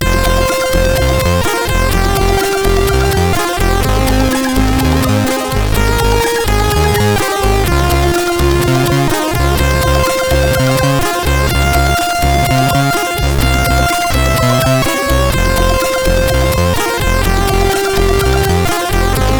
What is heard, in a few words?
sid CSG8580; CSG8580R5